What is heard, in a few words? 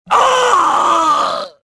death